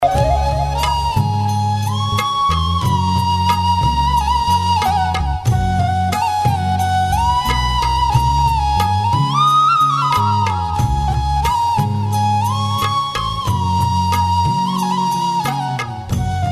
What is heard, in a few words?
music